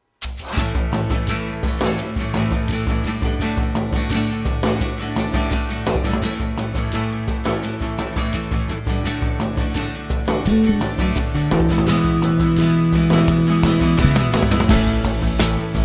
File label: Healing Rhythms